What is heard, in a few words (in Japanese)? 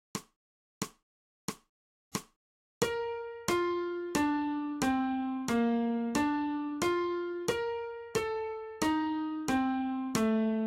新フレットレス